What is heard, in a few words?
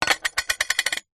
badge metal set down table spin